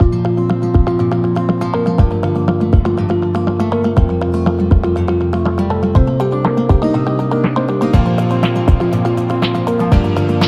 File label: Working Corporate; medical background